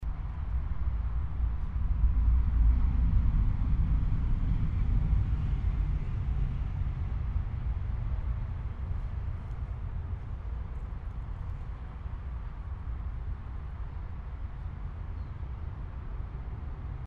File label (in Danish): Tog og motorvejsstøj optaget på yderpladsen i stillebænken